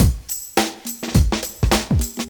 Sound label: 105 bpm 00s drum loop sample b key, Free drum beat - kick tuned to the B note